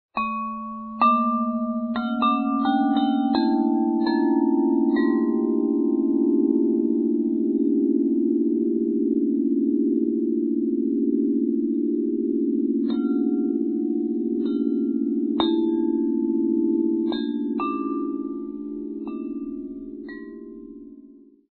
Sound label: Bass G